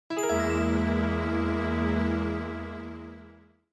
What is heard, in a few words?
MIDI Mystery; Star Merc